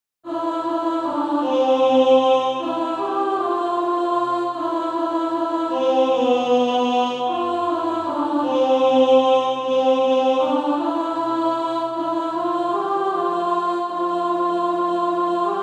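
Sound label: alto, SATB Practise Music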